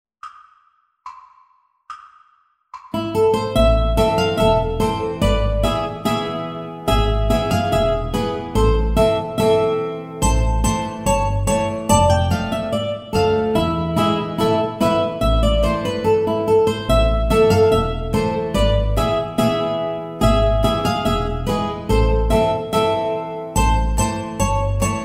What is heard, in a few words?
Polka